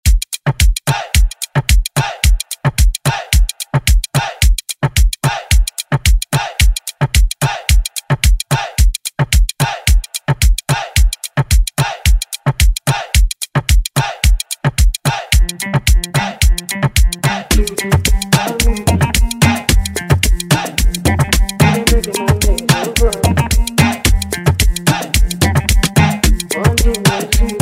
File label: Moombahton Redrum